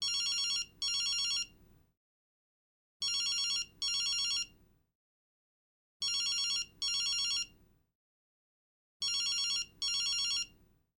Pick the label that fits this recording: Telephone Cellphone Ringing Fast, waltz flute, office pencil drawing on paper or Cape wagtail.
Telephone Cellphone Ringing Fast